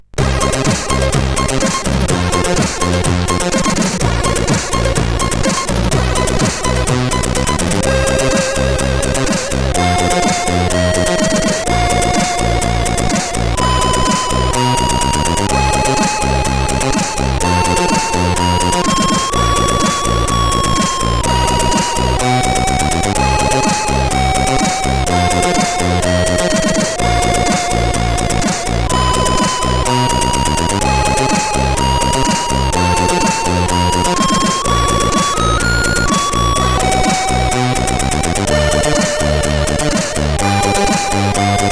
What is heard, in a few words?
Lazer Logo, CSG8580R5